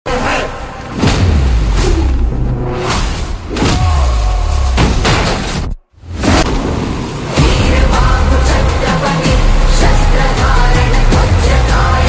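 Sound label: movie background music ringtone; chatrapati movie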